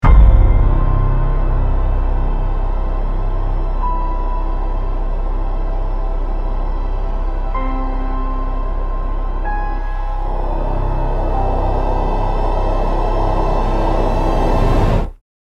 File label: Dark cinematic suspense riser horror